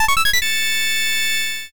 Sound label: fullcombo